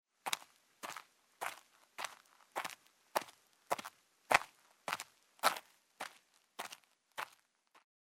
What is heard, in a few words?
Footsteps; Microsoft Studios; Age of Empires III - Complete Collection; cinematics